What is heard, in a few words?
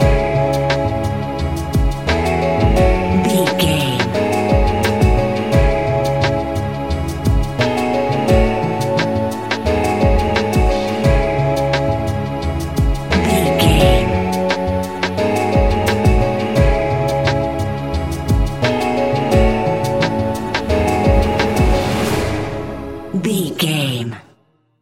enchanted fog, A relaxed and chilling piece of music; A relaxed and chilling piece of music, great for light documentaries, background music for tv shows, lift